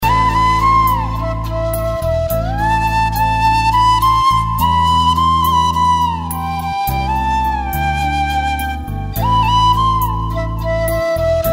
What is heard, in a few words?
Flute Ringtone